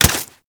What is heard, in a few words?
Staff Grab Idle; Warframe